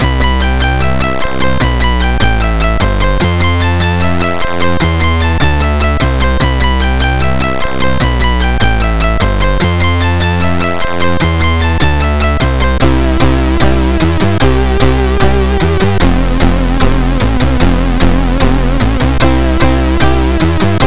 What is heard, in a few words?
c64 in game; r type